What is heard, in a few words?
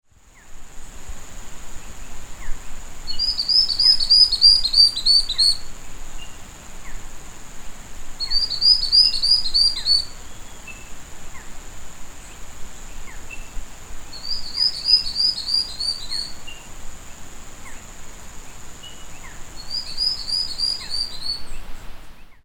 Myrmoderus loricatus Formigueiro assobiador